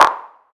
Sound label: clap A key, hand clap sample tuned to the A note. Loudest frequency: 1238Hz; percussion; single hits one shots